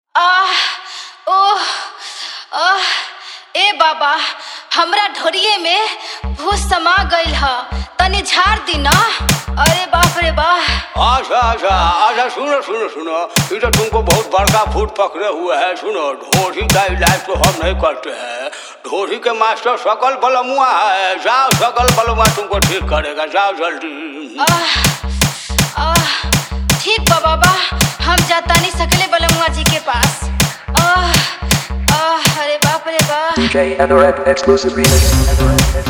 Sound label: Full Dhollki Bass Rod Dance Mix; Old is Gold